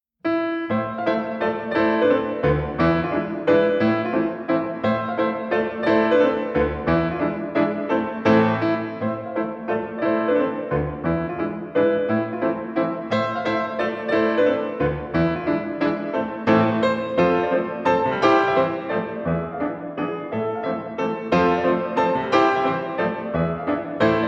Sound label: Polka-mazurka a minor